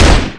chaingun fire; Weapons; EK modern; Barotrauma

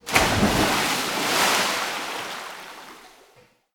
Splash, Water; Barotrauma; Subsurface

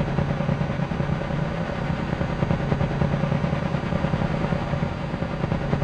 oxygen generator; Barotrauma; Subsurface

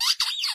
squeak; Barotrauma; Neurotrauma

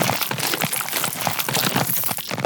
eat loop; Barotraumatic; Crook

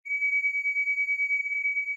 sonar Ping Water Far Beacon Directional; Barotrauma; Real Sonar

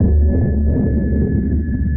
sonar Ping Suit Medium Shuttle Directional; Barotrauma; Real Sonar

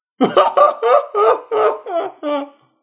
laugh; Barotraumatic; Clowns; Clowndeathmask

